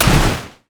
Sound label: snowday qspellbounce; LoL; ziggs snowday